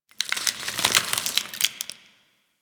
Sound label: Ice Crackle Amb